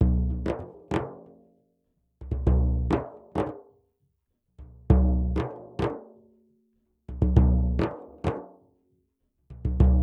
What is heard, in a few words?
duff 098, Hip Hop India, 098bpm; Hip Hop India Modern Percussion; Apple Loops